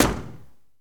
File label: Mustang Door Close; Automobiles